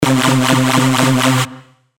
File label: Sound FX C